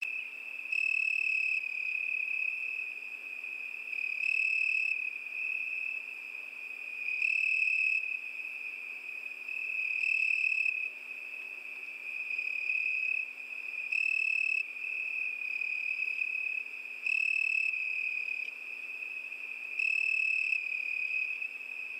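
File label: night sound of crickets on the lake; nasekomyh v prirode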